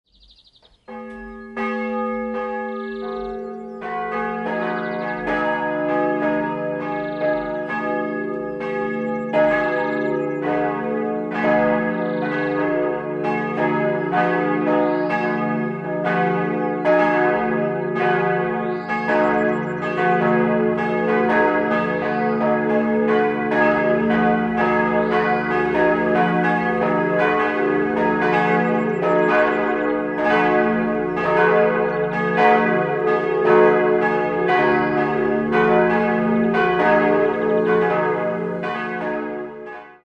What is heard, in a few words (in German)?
Sulzbuerg, Pfarrkirche, glocken; Sulzbürg, Pfarrkirche Mater Dolorosa; Marienglocke; gis'; Jäger, Eichstätt; Gebrüder Klaus, Heidingsfeld